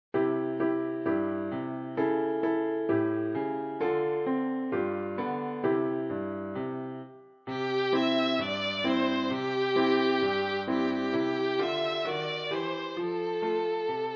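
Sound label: violin; Jingle Bells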